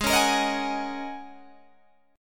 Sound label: Ab 9th Suspended 4th Ab down Guitar Open G